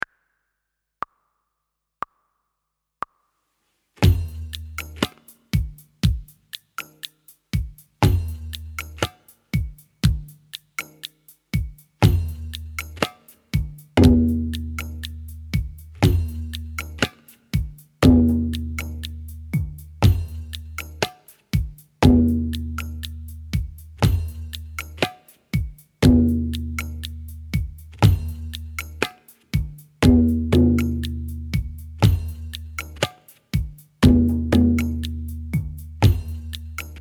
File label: Ga Practice with Ge and Ke With Tabla